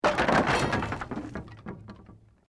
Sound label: Wood Collapse; Created dramatic effects of buildings collapsing or breaking; Materials